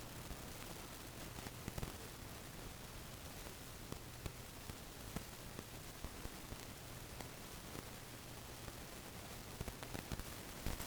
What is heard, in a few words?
static or interference samson go mic; usb condenser